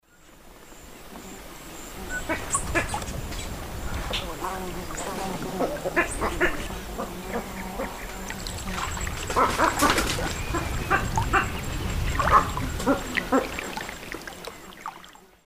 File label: Cochlearius cochlearius, calls; S. America; Eastern Lowlands